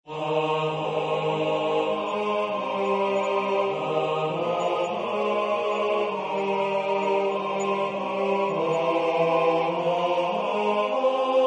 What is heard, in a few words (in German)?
Hymn - tenor